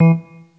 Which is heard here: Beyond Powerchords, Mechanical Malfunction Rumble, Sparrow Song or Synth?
Synth